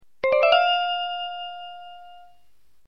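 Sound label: zvuki pravilnogo otveta, correct answer sounds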